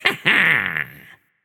heh heh, voicepack; smite; jorm

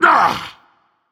grunt attack, dragon; fafnir

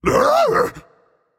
surprised, voicepack; smite; jorm

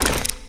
movement run; smite; Arachne

